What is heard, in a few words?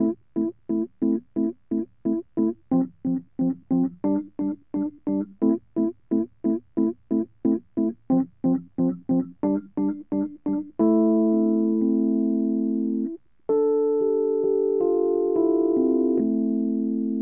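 rhodes, D minor, Hip Hop & RnB; Hip Hop & RnB 3; Love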